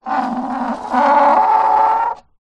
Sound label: plastic object small slide scrape squeak; foley